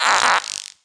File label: scarab attack; DAoC; Toa; Gamestar